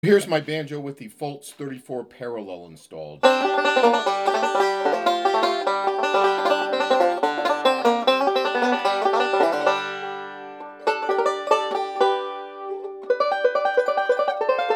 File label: banjo